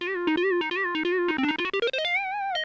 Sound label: hammer